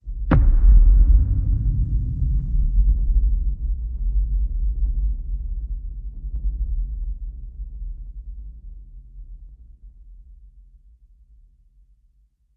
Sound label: Hit Low Rampart Rebellion